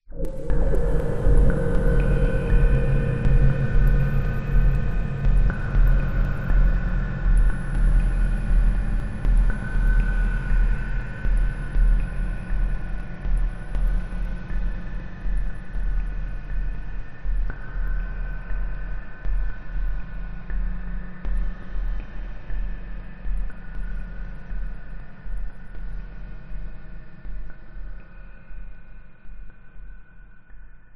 sound design cinematic drippy wet mechanical horror dark drone hit atmos